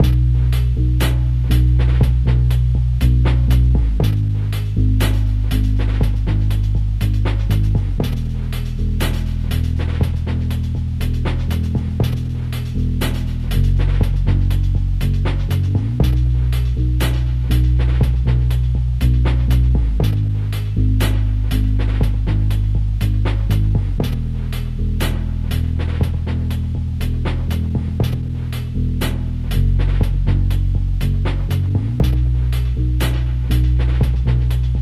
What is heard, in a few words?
lo fi hip hop loop; septimbral